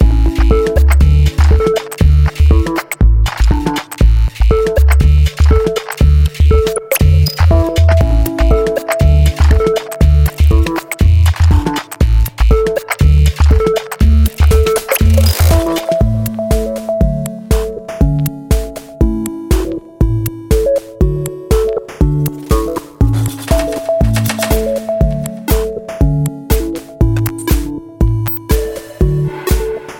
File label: Gameboy Alarm